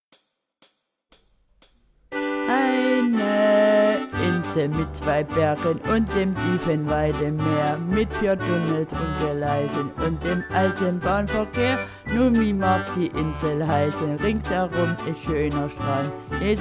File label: Karaoke